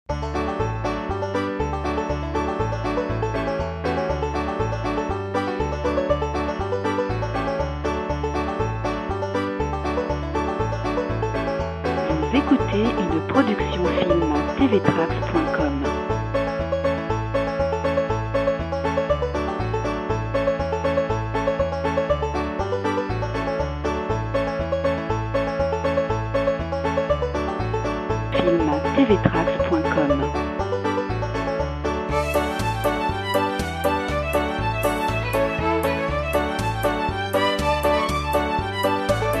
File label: Rag Square Dance Saloon